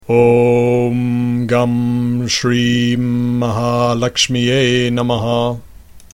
Om Gam Shrim Maha Lakshmiyei Namaha, sanskrit mantra